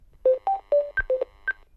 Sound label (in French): Telephone GIGASET DA710 Sonnerie